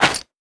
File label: Footsteps Dirt